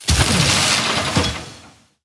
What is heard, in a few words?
Mecha factory Construction